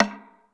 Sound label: GATE P DRUM; Crypton - DrumBase5000; GATE KITS